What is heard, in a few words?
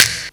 snap, Claps and Snaps; E Lab; XTc Files of House